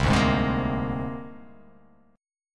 C 7th Suspended 2nd Sharp 5th, C7sus2#5 chord; C7sus2#5 strummed; JGuitar

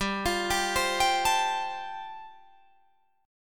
G 7th Suspended 2nd Suspended 4th, Guitar Drop D

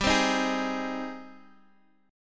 G sharp Major G, guitar chord; strummed; JGuitar

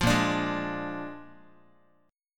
A sharp 7th A sharp, Guitar; A#7; strummed; 8,6,5,6,x,x

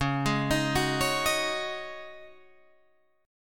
Db Suspended 2nd Suspended 4th Db Guitar Drop D

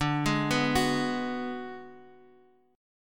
D 6th Flat 5th, Guitar, Open F; x,x,2,3,2,1; arpeggiated; JGuitar

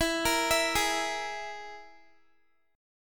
E Major Flat 5th, Guitar; EMb5 arpeggiated

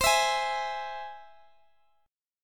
Ab Augmented C, Guitar

